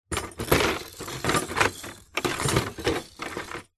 percussion items kids in plastic container movements